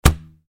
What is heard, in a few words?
shoot arrow